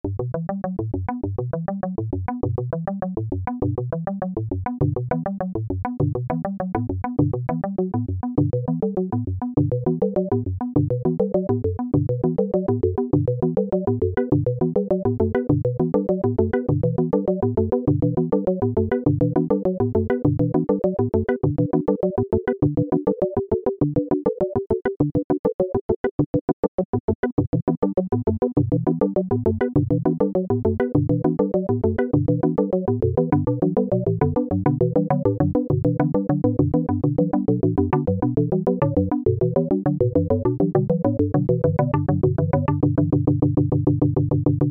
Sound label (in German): Polyphon mit Operator